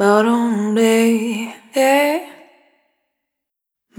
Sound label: Spin, Single Voice Melodic Loops